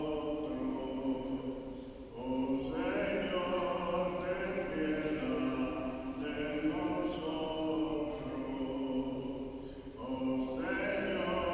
1st communion mass in the 17th century baroque san francisco church; lima